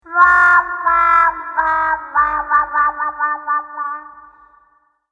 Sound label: Wah Wah Wah Wah